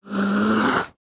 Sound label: Hit React